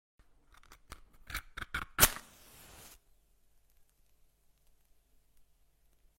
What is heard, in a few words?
match lighting on